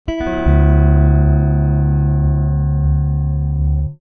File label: Electric guitar open strings out of tune